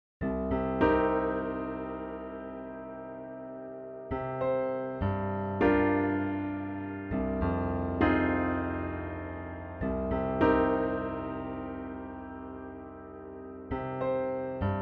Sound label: minor 7 example, playing piano with chords